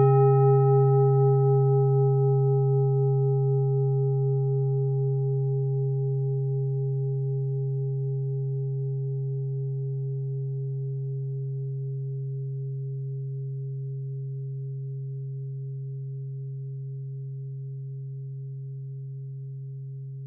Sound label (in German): klangschale ladakh; Die Klangschale kommt aus einer Schmiede